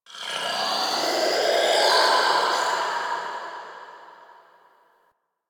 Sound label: Texture Interior Large, Ambience